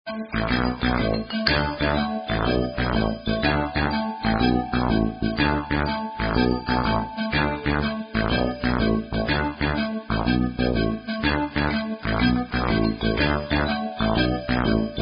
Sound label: The Frogs, Karaoke Songs; Girl Scouts